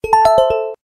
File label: Celesta